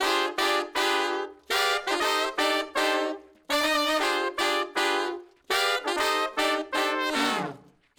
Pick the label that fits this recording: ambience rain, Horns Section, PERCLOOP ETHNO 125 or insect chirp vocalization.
Horns Section